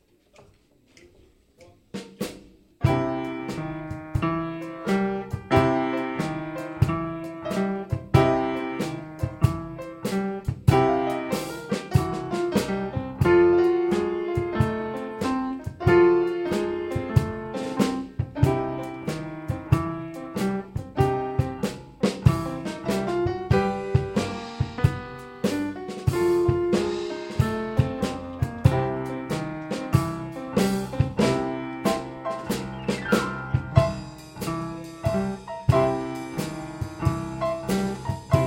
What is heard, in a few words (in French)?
Blues en do